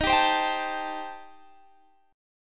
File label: D sharp 6th Flat 5th, Guitar, Drop D